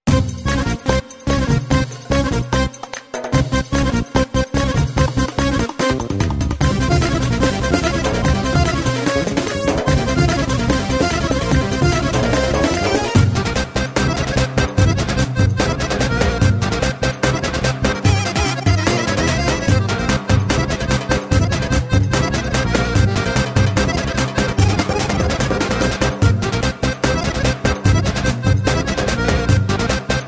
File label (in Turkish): Roman Ciftetelli